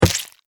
Insect Squish, Insect, Squish, Hit, Windshield, Hard, Splat, Thump, Impact, Bug; Squishes; Blastwave; Animals:Insects